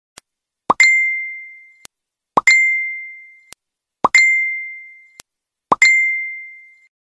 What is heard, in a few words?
Messenger Tone; SMS